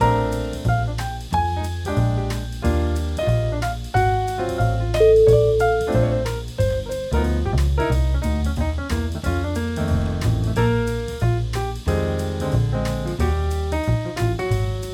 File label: happy jazzy party